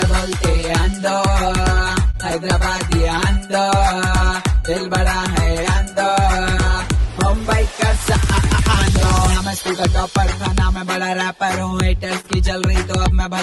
romantic cool ringtone

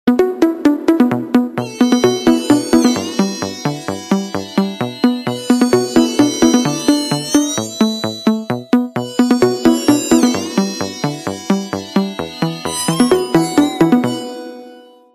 samsung ringtone

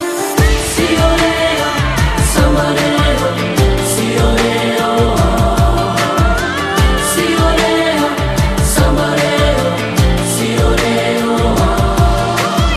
sad tune ringtone

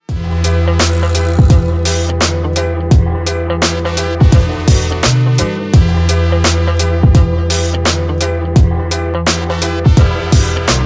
reggae hiphop ringtone

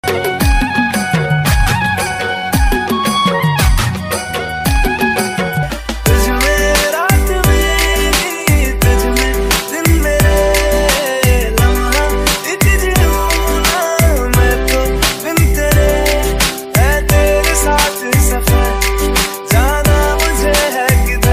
hindi ringtone, bollywood ringtones

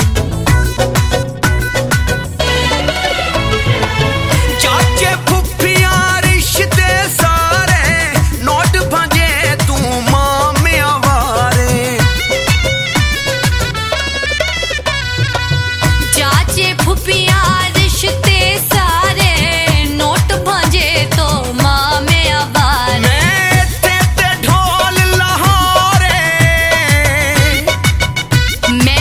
punjabi ringtone

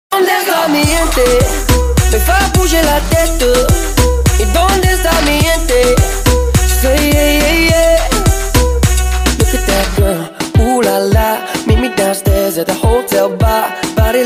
new english music ringtone

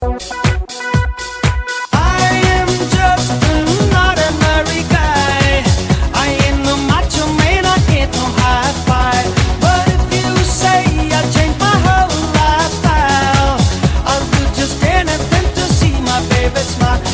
love ringtone

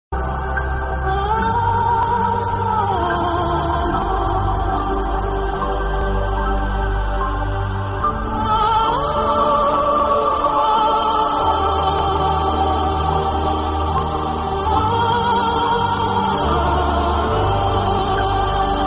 angel melody ringtone

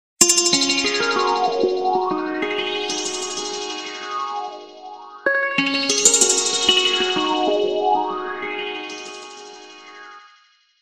nokia beats

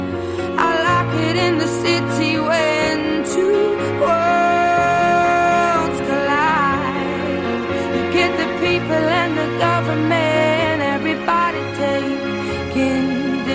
english music ringtone

